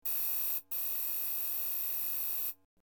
Constant Beeping Vid, beeps & tones

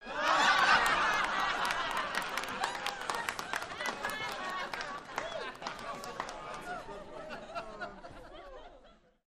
Crowd Short Laugh, small int

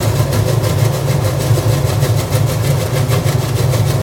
Propeller Mvmt Above, propeller movement

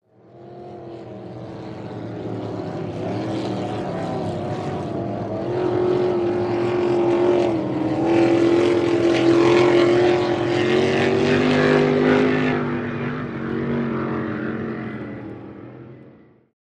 Power Boat By Fast, off shore power boat

